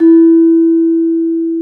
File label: GENDER PAN, Bronze Gamelan